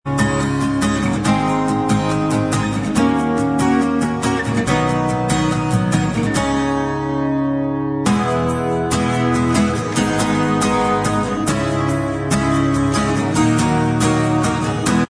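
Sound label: acoustic version, instrumental, backing track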